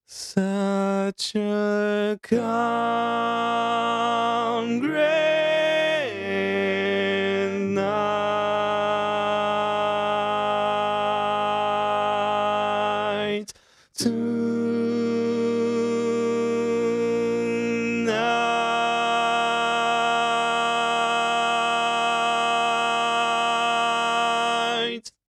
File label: Lead, barbershop